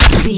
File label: gun silenced rifle 3 shot